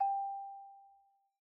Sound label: Xylophone Soft G4 pp far, Soft Mallets; Idiophones; Struck Idiophones